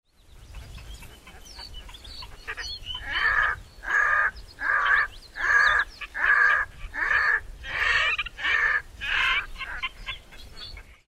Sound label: red necked grebe